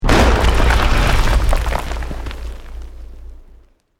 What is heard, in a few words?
EXPLOSION MEDIUM STONE ROCK